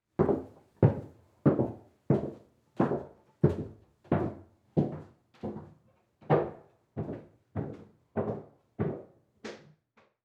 Foley Footstep Cowboy Boots Walk Down Stairs Mic At Top; Foley Footsteps